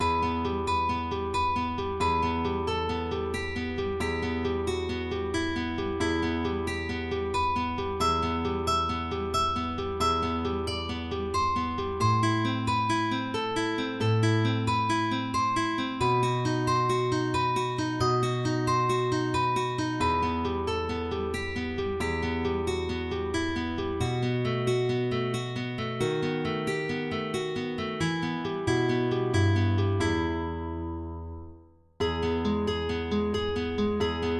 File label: spanish romance